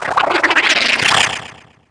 neriad die; DAoC; Toa; Gamestar

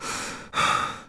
swing; shadman

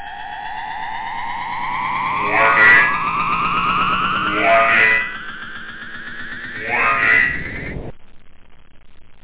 WARNING; da capo vol1; instruments

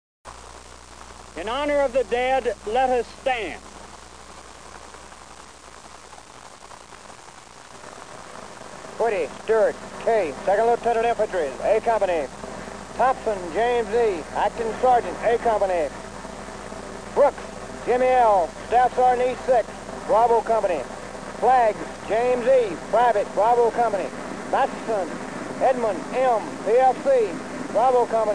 ambien

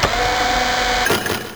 connect; Net Power 2000 #2

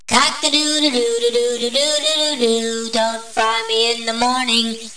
Bird Fry; Museum of Anything Goes

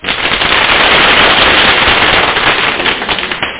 clap

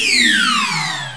stealth fade out; Earth and Beyond; EA Games

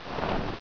die slide; Réflexion; Boxcars Casino 1.0b; Boxcars Casino™

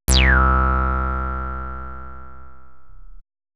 Bass

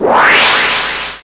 ASTRO-LANDING; Museum of Anything Goes

